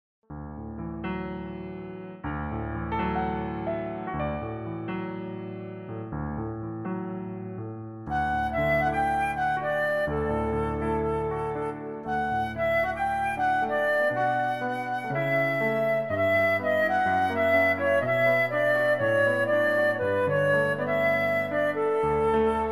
flute

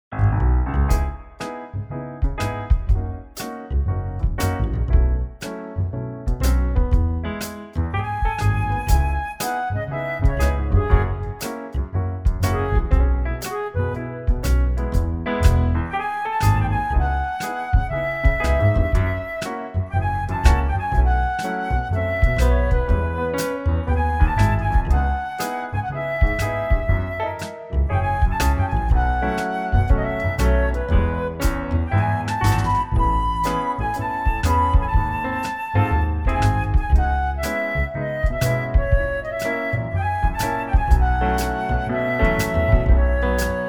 play flute reference

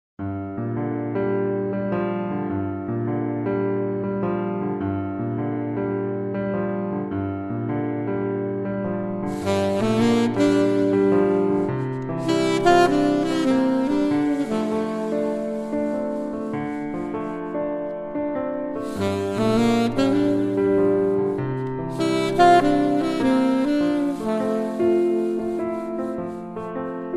Saxophone; La La Land

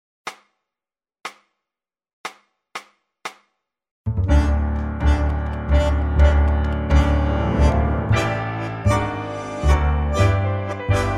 trio

